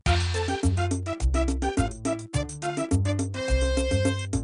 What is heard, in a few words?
Start; Boulder Attack 2; Maizy